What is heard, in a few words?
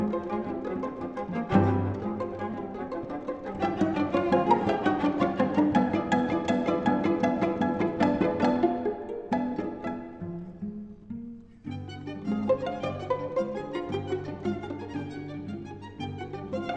classical